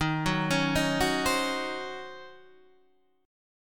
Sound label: Eb Minor 13th Eb down Guitar Open D